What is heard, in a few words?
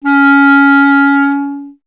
clarinet C#, Solo Instruments; Miroslav Vitous - Solo Instruments VOL-1; 90 s Sample CDs